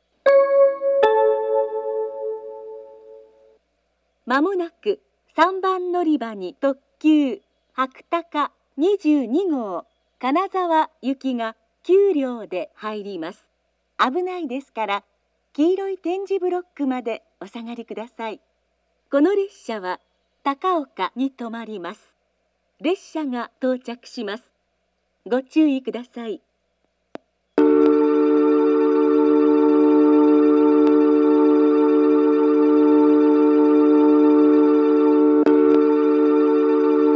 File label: toyama limited express; 富山駅 3番線; 接近放送; 特急 / 金沢 / 特急はくたか22号 金沢行 9両編成です。 / 途中、高岡に止まります。; jr-west